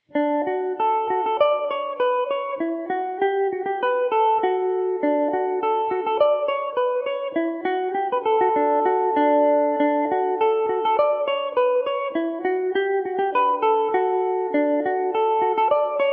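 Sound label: polka D major mandobird, irish mandolin; The Newmarket Polka; Epiphone Mandobird VIII electric mandolin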